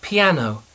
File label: piano